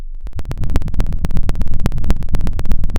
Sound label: pop, Sound of a fire crackling